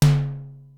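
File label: Simmons SDS-9 high tom, Drum Machines; Factory D5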